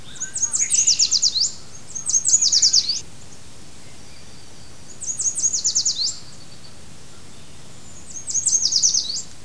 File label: yellow warbler song